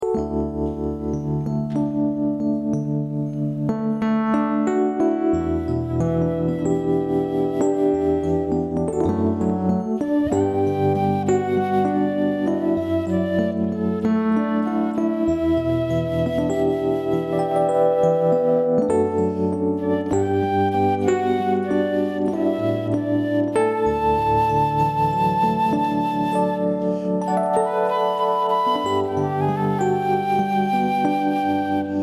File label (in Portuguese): instrumental infantil